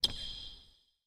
摩擦; nba; 战中

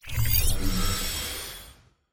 排名列表刷新; nba

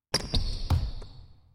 盖帽; 战中; nba

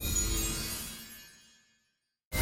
签约闪光; nba; 球探系统

